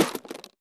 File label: metal drop, container; Escape from Tarkov